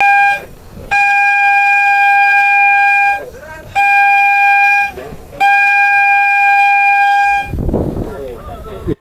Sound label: two tone electronic hooter in outdoor arena; horns